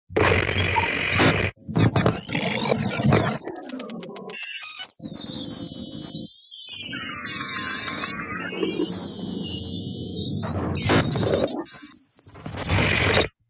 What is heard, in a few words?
thargoid audio signal